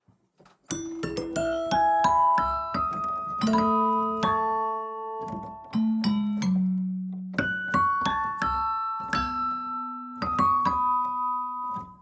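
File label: Music Box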